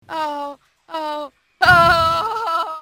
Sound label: moan